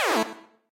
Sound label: spawn